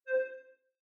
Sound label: charging; Xiaomi MIUI 9